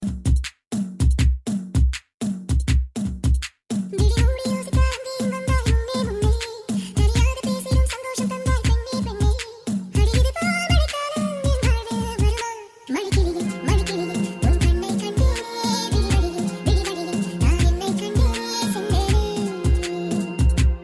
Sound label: Fast Rap